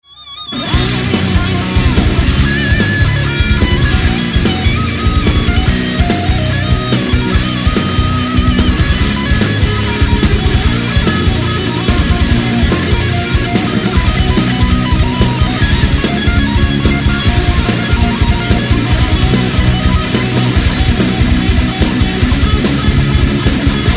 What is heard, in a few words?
rain guitar solo